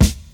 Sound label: seventies deep pop steel snare drum sample c sharp key